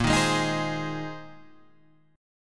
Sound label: A sharp 9th Suspended 4th A sharp Guitar Half Step Down